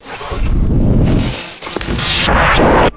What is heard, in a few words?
futuristic scifi transporter power up sweep